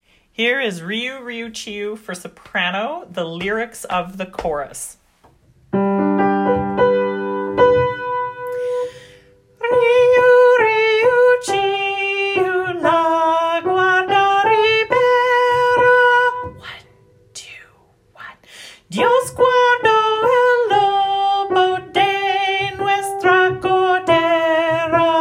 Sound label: sop lyrics; riu riu chiu